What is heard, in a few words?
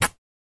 Fabric Tear Buzz